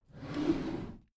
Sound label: packed drawer smooth slide open